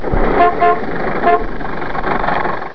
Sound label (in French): tutut